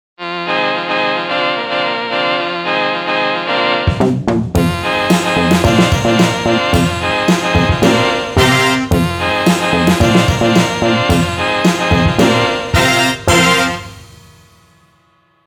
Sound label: comical